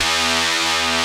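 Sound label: P5 guitar pad E1; VINT.KEYS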